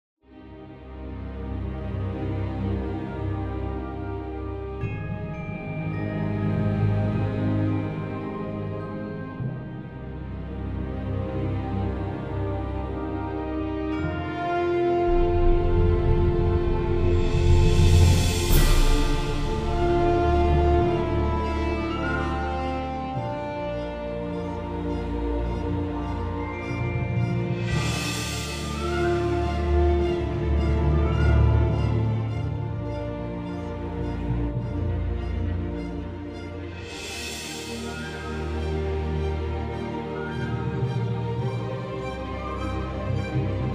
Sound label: Strategy Ambience; Master of Orion